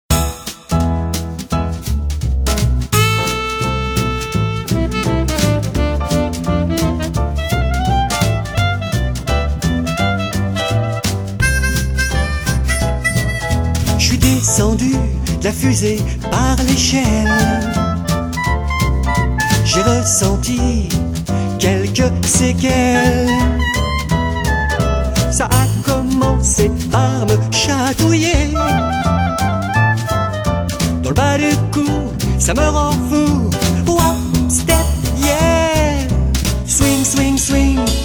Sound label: SWING